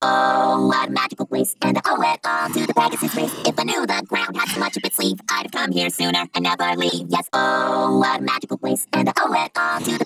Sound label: Chorus Full; Pony Fan Music Remix Stems; ILoveEverything; 131BPM